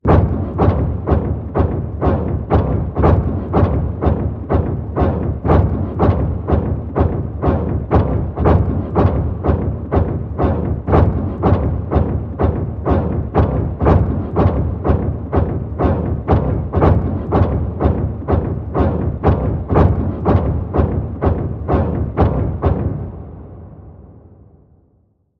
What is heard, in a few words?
Shadow Woods Slow Panning